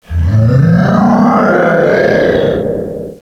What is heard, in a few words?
mghraaaaaw, echo inside caves, underground; DS; ML BOWSERS INSIDE